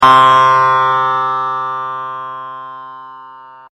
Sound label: SINEWAVE